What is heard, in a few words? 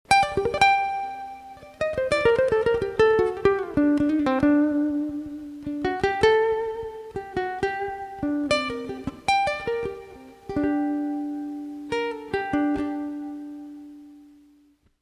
Strings; Ozielzinho